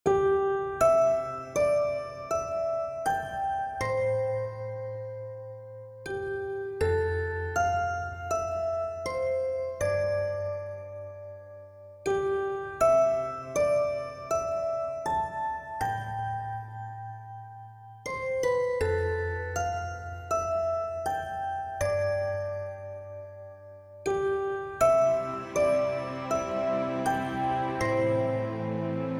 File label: longing